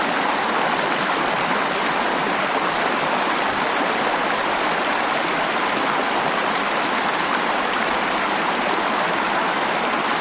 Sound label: River Loop; Environment; BackgroundLoops